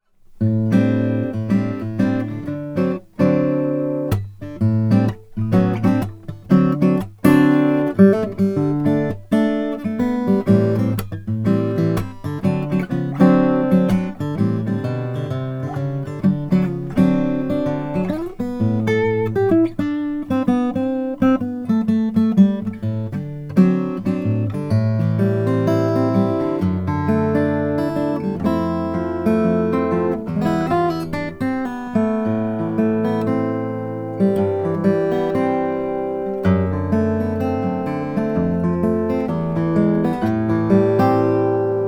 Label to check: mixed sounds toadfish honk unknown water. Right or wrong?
Wrong